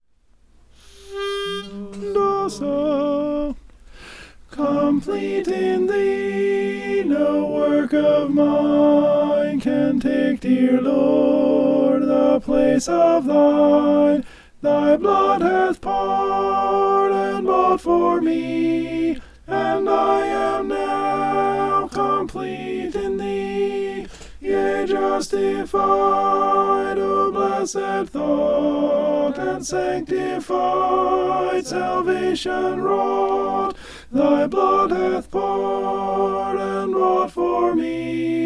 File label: alto; complete in thee